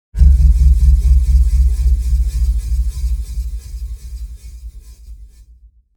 Rusty table fan spinning; appliances

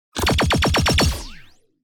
Sci-fi weapon assault rifle bursting

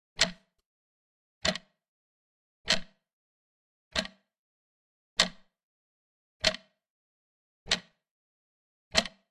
Old vintage clock ticking

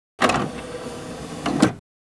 DVD player tray open or close

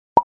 Cartoon idea pop

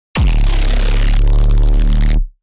Distorted energy beam power blast

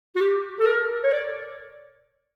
Mobile game playful fun alert notification

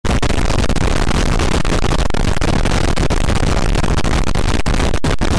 Triggered earrape long; bass boosted

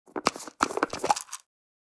Plastic pill bottle twist cap opening

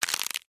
Bone breaking

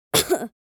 Little boy single cough; gfxsounds

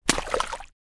Pig moves in wet mud inside shed

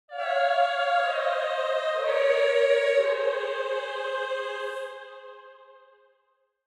Angelic choir angels; heavenly grace

